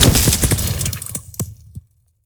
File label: shotgun fire; gmod; viper